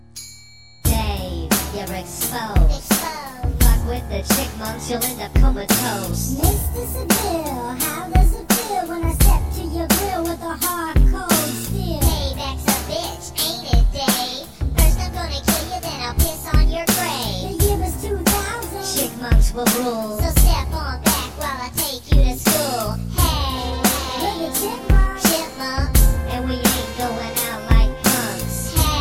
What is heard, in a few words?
Chipmunk Rap